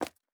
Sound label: Footstep Concrete Running